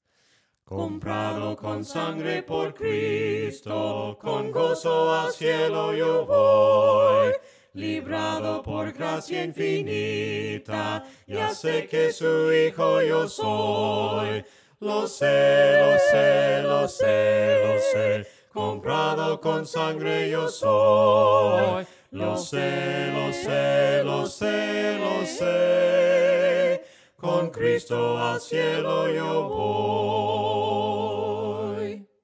VOCES, himnos a capella; cantos del camino

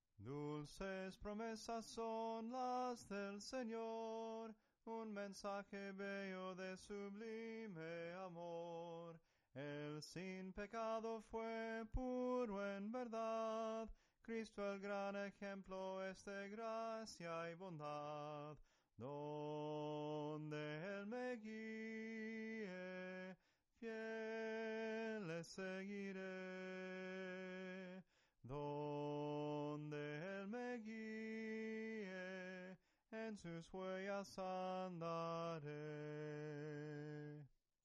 SOPRANO CANTADO, himnos en melodia soprano

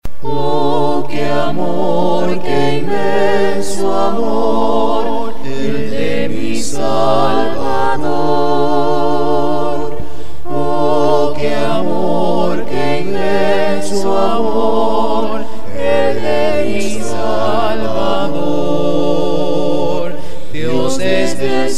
OH QUE INMENSO AMOR, himnos a capella; cantos del camino

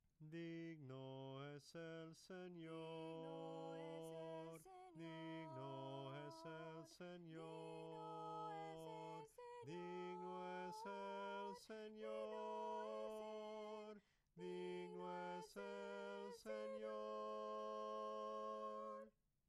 SOPRANO CANTADO; DIGNO ES EL SENOR

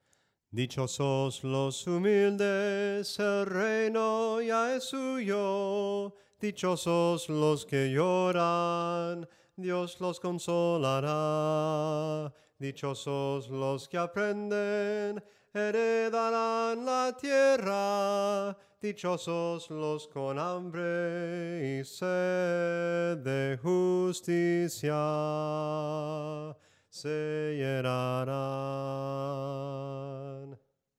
SOPRANO CANTADO, himnos; DICHOSOS

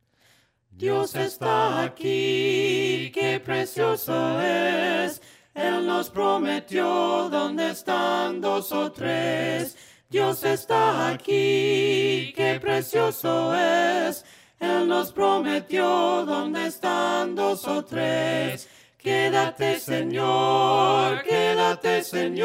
VOCES

